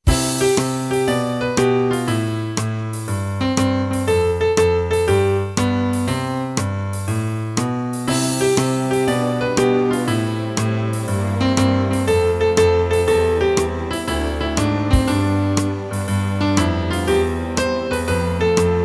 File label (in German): Piano